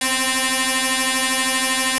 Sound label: noise